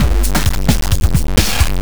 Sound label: Beat, Drums, Eclectic Electronica